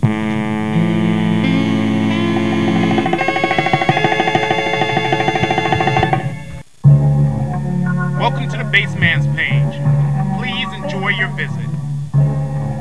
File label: bass